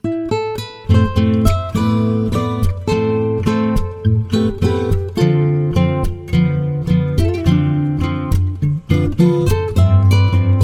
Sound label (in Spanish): nuevo guitarra castellano tono de llamada